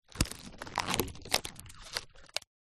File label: balloon skin stretch handle; foley